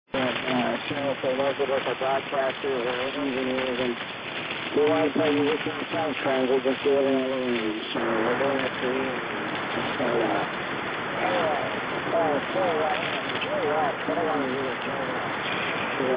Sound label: MHz USB